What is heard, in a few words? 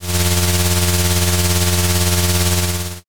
shredder